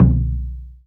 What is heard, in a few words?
B1, Sho Percs Pad Shaku, CASIO FZ-1; Retro Sampler